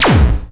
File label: proton; endless sky